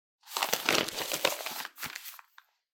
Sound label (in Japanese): 新聞紙を広げる音